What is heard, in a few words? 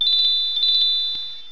Heal Bell; pokerogue; battle anims